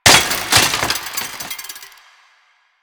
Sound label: Bicycle Hit; Warframe; Ambience; Nineteen Ninety Nine